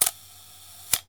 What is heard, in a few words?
Camera